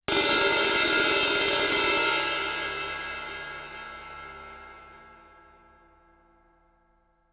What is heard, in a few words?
crash stick roll, Suspended Cymbals; Percussion